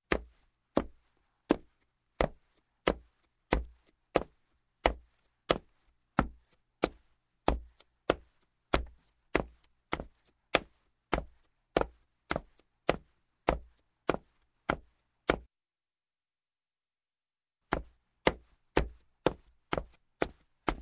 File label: Footsteps, Wood Down Wooden Steps Boots, Slow; Sound Ideas 2000 Series